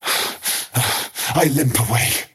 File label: crown teleport pain; skywrath mage